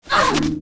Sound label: peace damage